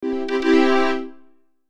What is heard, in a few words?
Alert; Tyrangel Alert; Sony Ericsson; PlusFourSix; Tyra (G900)